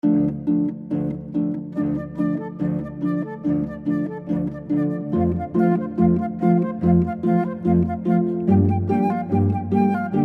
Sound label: traditional Jewish, harp flute cello